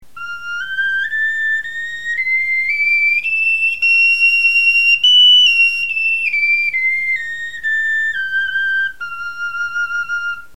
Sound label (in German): tonleiter, knochenfloeten